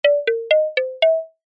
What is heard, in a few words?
cartoon footsteps short sneaky wooden mallets